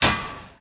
damage hits explosions, Warships

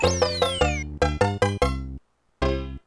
goal; Super Mario All-Stars (SNES); smb3

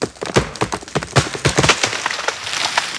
pole creak; World of Tanks Blitz

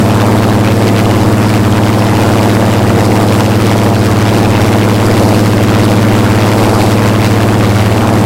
griffon rear, engines; War Thunder

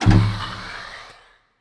tank cylinders

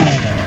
snowmobile rampdown; veh snowmobile c22 rampdown

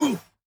flinch light; Rust

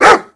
animal dog bark

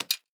salvaged axe tap; Rust

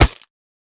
bone club strike; Rust

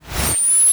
drone laser chargeup

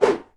Axe Swoosh; Civ4 warlords

